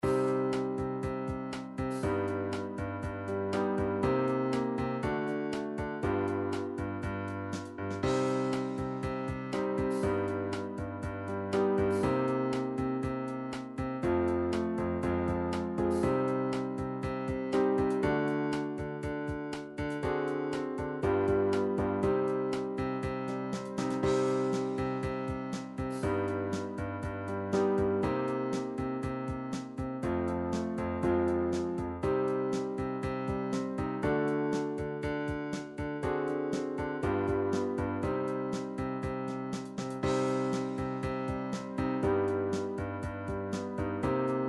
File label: classical ballad, midi